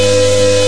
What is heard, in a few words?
engine high rpm